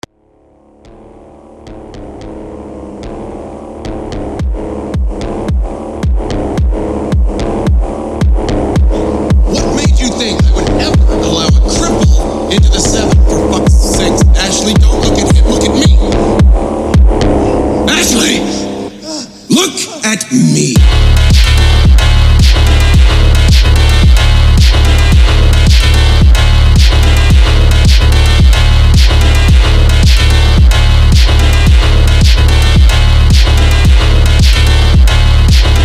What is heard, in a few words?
phonk